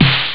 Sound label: techno, drums snare; OctaMED SoundStudio V1